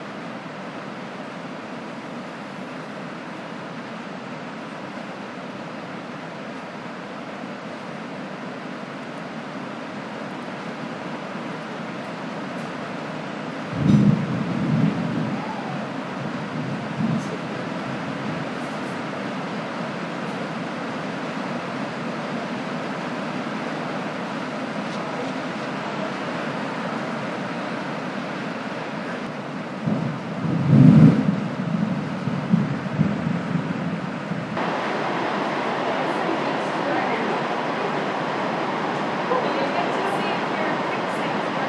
thunder clappy

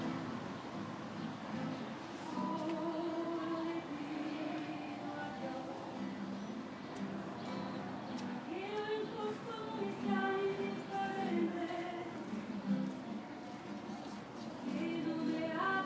outdoor ambience with live folk in background

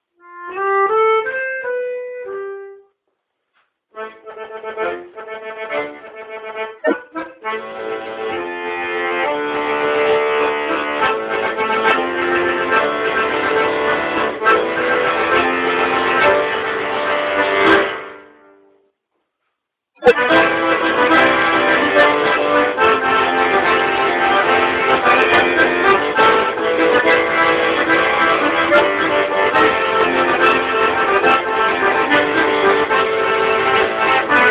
accordion

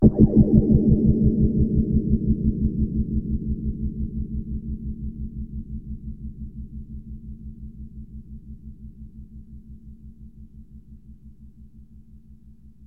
clav on piano down 3 octaves

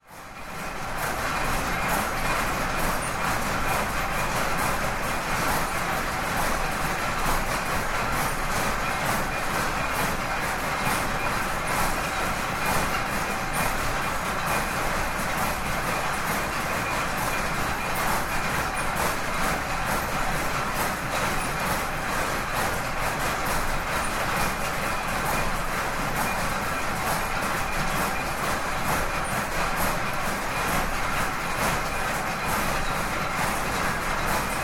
yorkshire loom operating, working textiles museum; masson mills; matlock bath

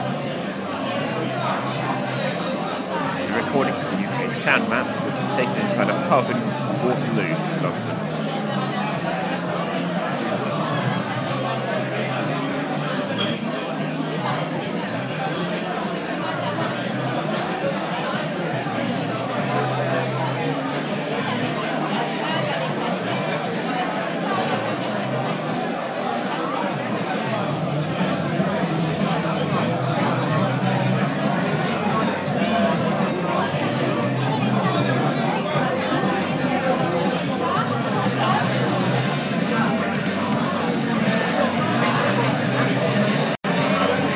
inside a pub near waterloo station; uksm

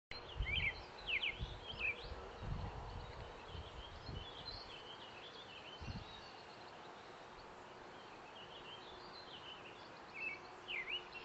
beautiful birdsong at the royal botanical gardens; recorded with my friend kim and her family

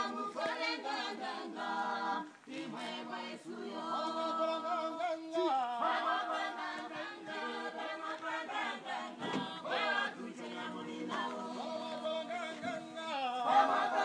choir practice livingstonia malawi

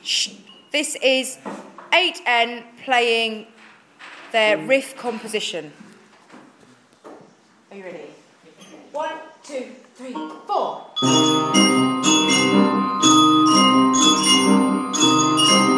riffs

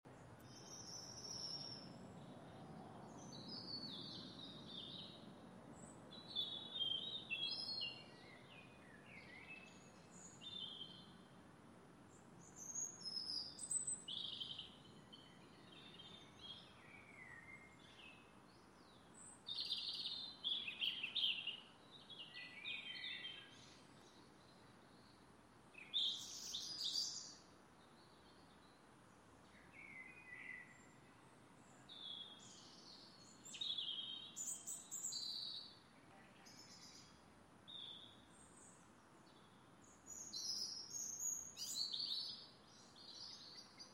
dusk garden birdsong

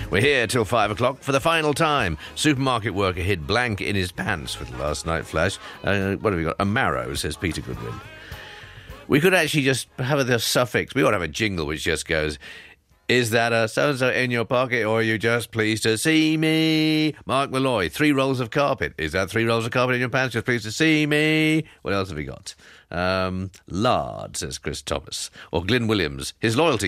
sung jingles; lex lester s final weekday show

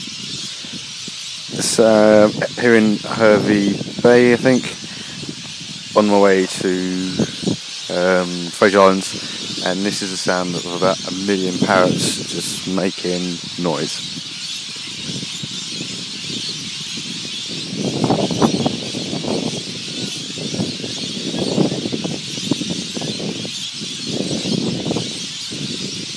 parrots; hervey bay

